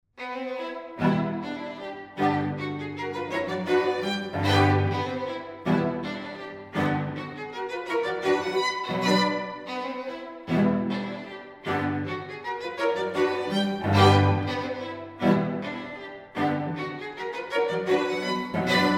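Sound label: string trio; rontgen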